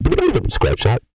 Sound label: GOOFY, MALE HUMAN; ILIO; Vocal Planet